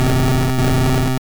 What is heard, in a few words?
Egg Bomb